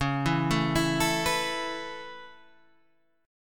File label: A Major Add 9th C sharp